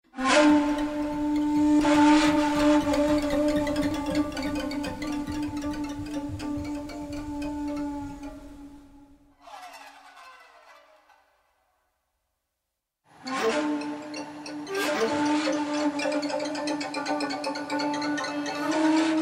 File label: shaku; shakuhachi, shamisen, string quartet 尺八、三味線、弦楽四重奏